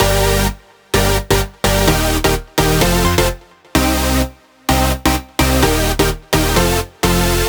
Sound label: 128BPM Synth; Vengeance Samples; Celebration Kit